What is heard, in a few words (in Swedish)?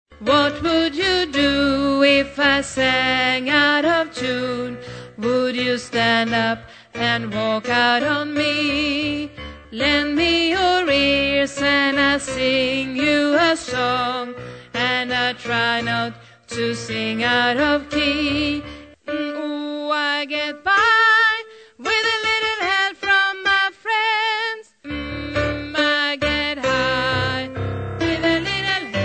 bas, stammor